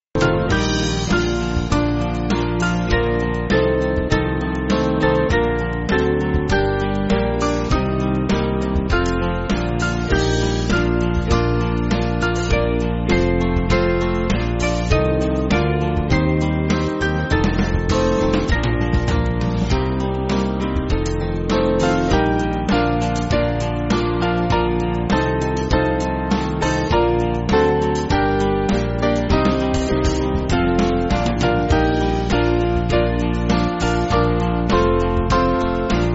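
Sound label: Band, Backing Files for Churches; The God And Father; Paradwys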